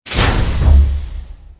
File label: door; Soldier Of Fortune II Double Helix; XBOX